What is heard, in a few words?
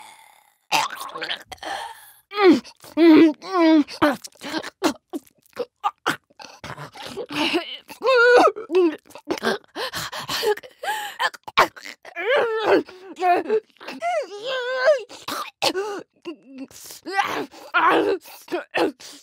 female runner; The Last of Us Part II